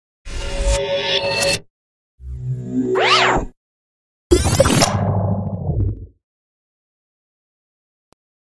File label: Transit Zipped Quick Scale